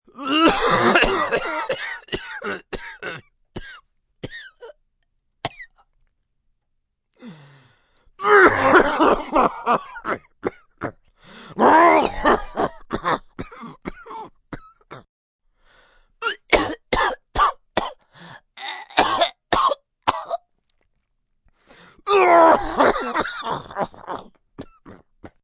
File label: Cough Long, Cough, male, sick, cold, long, smoker's cough; Clearing Throat